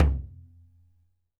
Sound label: MADAL, DRUMS OF IND; AKAI S6000 CD-ROM; WorldSounds